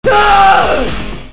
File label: shout